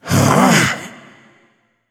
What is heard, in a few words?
confused; smite; janus